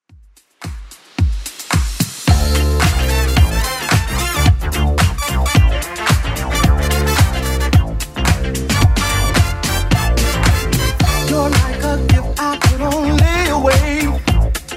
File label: 80s Club 110 bpm CLEAN